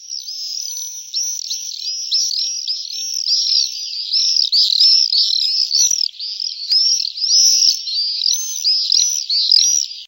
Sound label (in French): martinet noir